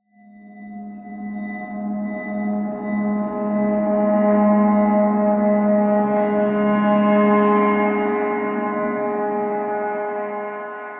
fading drone bell

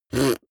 beatbox effect suck in damped

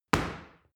paw kick hard

